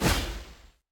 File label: Chain armor Activate